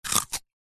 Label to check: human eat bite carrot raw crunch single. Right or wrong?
Right